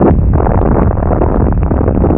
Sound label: Explosio; freetrading